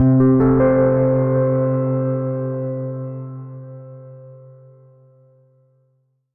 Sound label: Stinger Complete Puzzle; pan pan a tiny big adventure